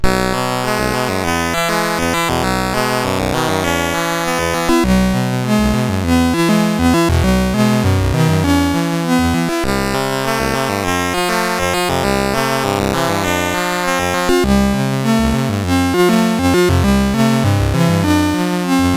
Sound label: Zula, sid CSG8580R5